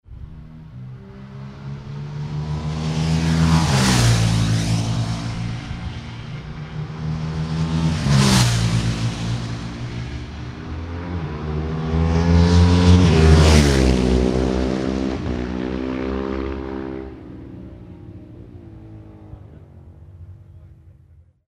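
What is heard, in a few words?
Ducati 998 triple; moto; Ducati 998 - passage 6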